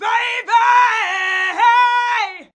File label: diva baybeyyy hey; Cheesy Divas; VOCALS and SPOKEN WORD